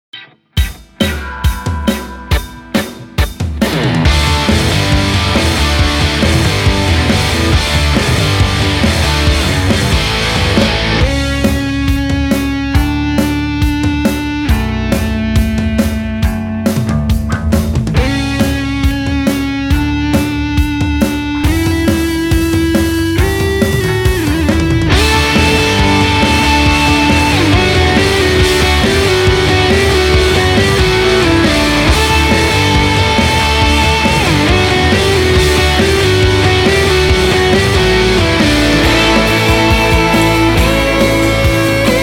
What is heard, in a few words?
stage performer